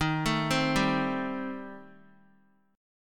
Eb 6th Flat 5th Eb down Guitar; Eb6b5 arpeggiated; JGuitar

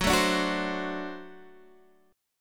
D 7th Gb, guitar

